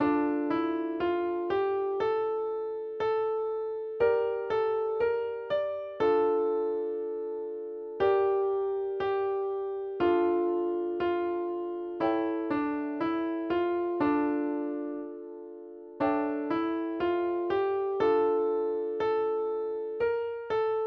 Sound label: instrumental